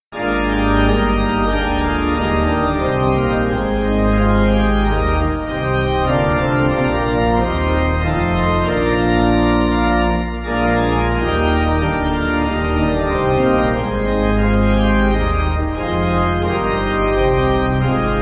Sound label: Pipe